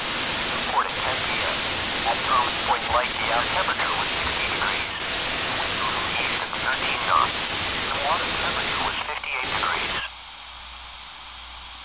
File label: effect news loop static; lucius